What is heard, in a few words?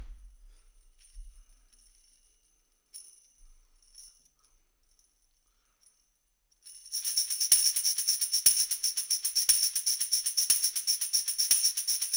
Tamb